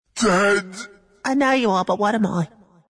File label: death; dota; ogre magi